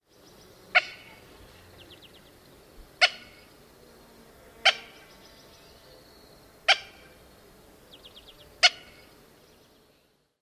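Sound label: Cooper's Hawk kik calls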